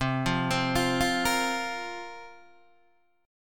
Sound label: C 7th Suspended 4th, Guitar, DADGAD